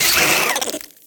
Insects, alerted

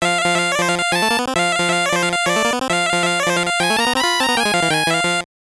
Cutscene Jingle; pac man gameboy

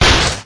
Heal; lego ninjago rise of the snakes

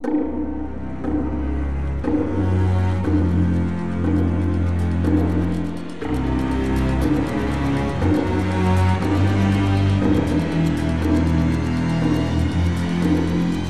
STRINGS INTRO D2; gamerip; ACTIVATING TRYP

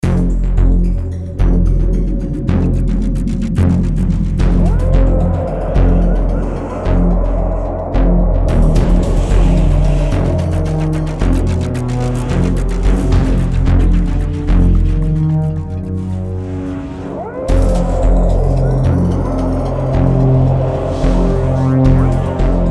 menu music; starsiege gamerip pc

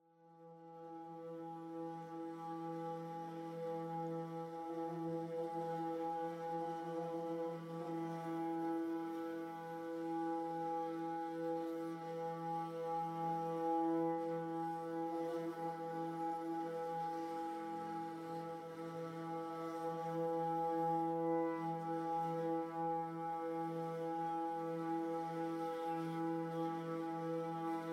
Theme Edgy Mood; The Last of Us

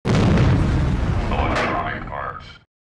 Logo; Electronic Arts

